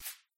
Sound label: board highlight